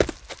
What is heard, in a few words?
move; GrandFantasia